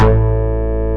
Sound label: DIGI BASS; DIGI BS